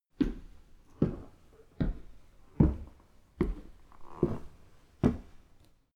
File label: step stair walker